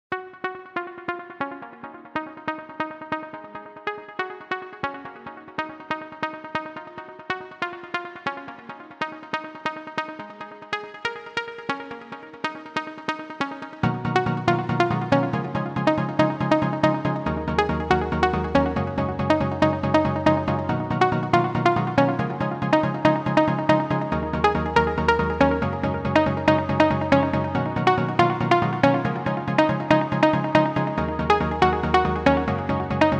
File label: pluck; rdgaudio